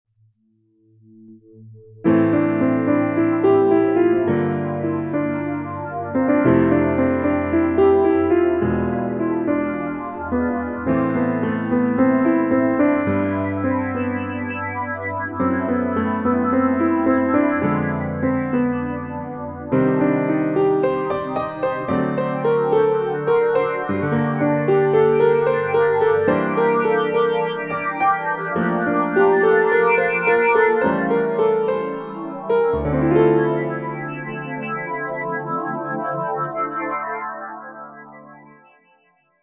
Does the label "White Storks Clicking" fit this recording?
No